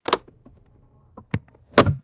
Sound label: plastic car glovebox opening and closing